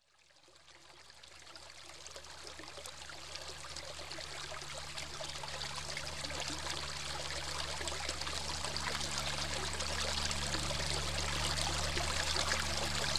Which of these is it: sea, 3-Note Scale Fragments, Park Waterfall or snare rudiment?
Park Waterfall